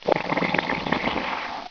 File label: GURGLE; homm2